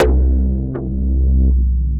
Mean Creaked Bass; Ableton; Factory Packs; Punch and Tilt

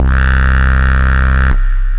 Bass Raw; Drive and Glow; Ableton; Factory Packs

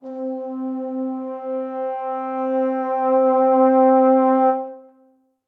MPE Vibrato Slide, Brass Quartet; Ableton; Factory Packs; Spitfire Audio

